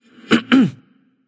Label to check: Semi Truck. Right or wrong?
Wrong